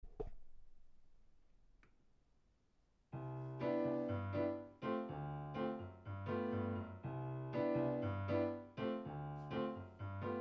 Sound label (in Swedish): paskcalypso stenen sopran